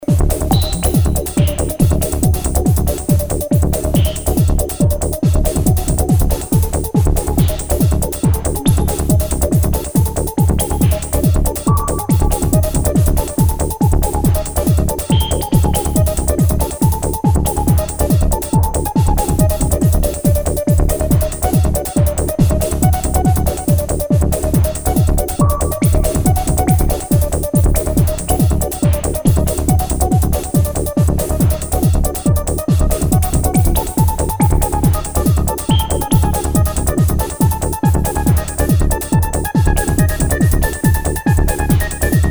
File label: indoor, progressive trance